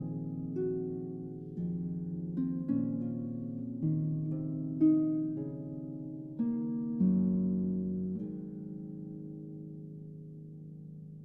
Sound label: Harp Concerto Lullaby